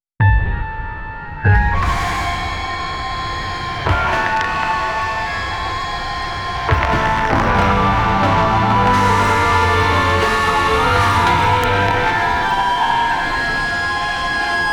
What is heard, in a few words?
evil positive music